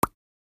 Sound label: Water Drop Notification; Xiaomi; Redmi-Note-12-4G